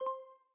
Land; lock A Luck

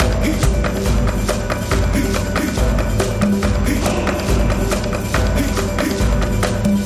Re Spin Music Loop; Tiki Mania

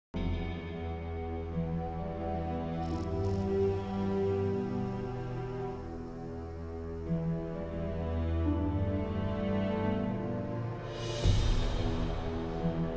base Game Sound; alchemist Stone

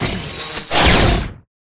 bonus tyra ranged; Recode; rampage riches

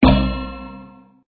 mine Land Sound; crystal Gems; Core Gaming

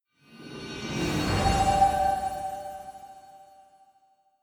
Queen Symbol Bonus Animation; genesis; clash Of Queens

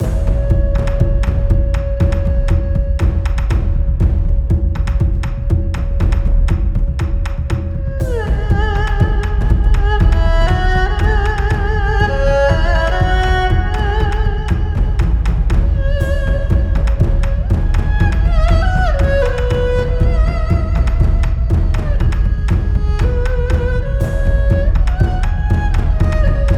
Bonus Loop; Wu Xing

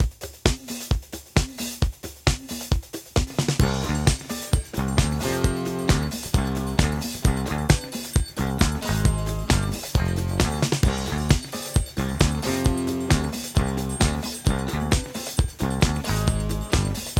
Base Music Loop

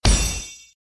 star award; Recode; jewel Quest Riches

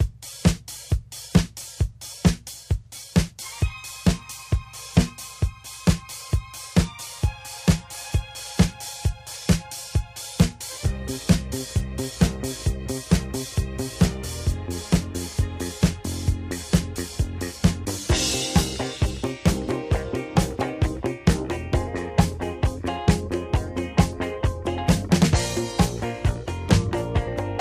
Music Base Music Loop

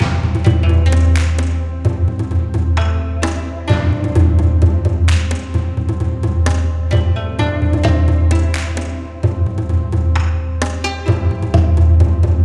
Base Music Loop; Long Mu Fortunes

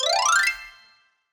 bar filled; Skillzgaming; fruit Blast 92 Desktop

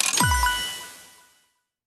bigwin countup treshold; riviera Star; Fantasma

